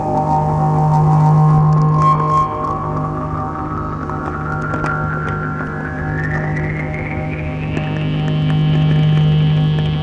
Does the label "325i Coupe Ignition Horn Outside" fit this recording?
No